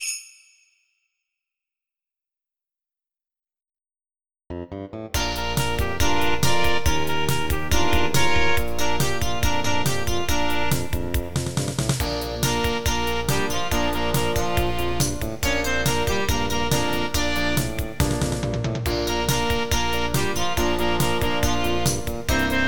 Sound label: Splish Splash Bubbles Intro